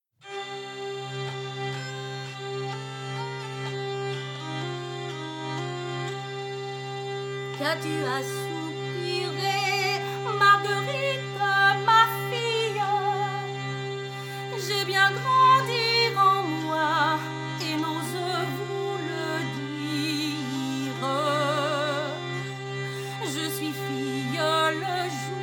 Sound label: Tavern; Assassin's Creed Unity